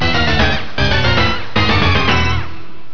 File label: tune; Professor Multimedia